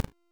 Void Dagger Proj Shot; Warframe; Weapons; Melee; Zariman Dagger; Void Mode

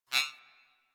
Beep Comby; Warframe; Ambience; Corpus; Beeps

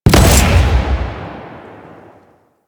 Missile Barrage Fire; Enemies; Weapons; Warframe; Corpus; Arachnoid Camper

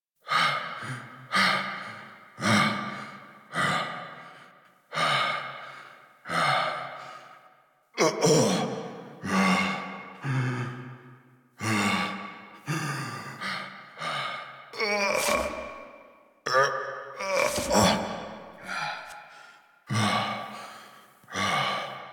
Cave Descent Breaths; Warframe; Veilbreaker; Kahl

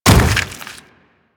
Ancient Tank Step Default, Infested footsteps; Warframe; Emissary Ancient Tank

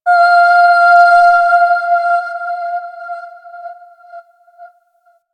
Opera Note; Ambience; Opera House; Warframe; Orokin Moon

